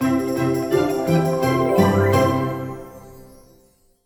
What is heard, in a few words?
win Sound; DragonDrop